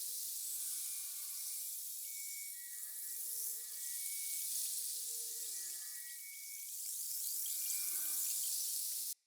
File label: Stag Wild Exclaim Arm Loop; Powers; Stag Pact